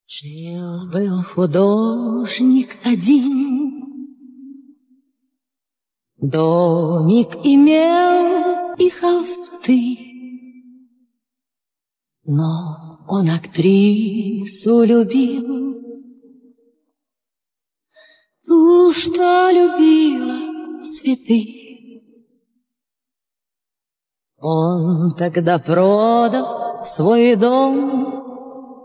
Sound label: acapella